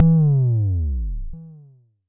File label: Jomox MBase, drum machine; Jomox MBase 01